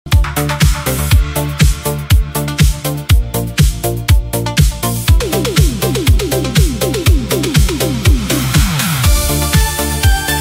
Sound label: ocean lights tonight modern disco